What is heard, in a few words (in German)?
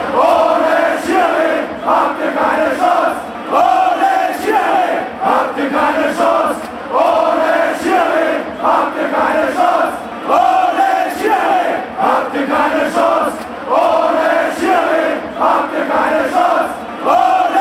ohne schiri, fan chants